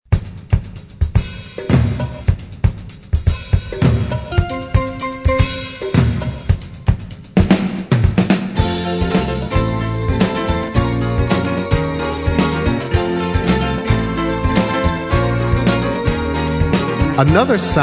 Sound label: Contemporary Jazz